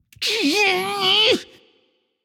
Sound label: grunt lift; smite; loki